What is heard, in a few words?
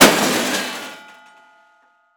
Crash Metal With Ring; crashes; AdobeCC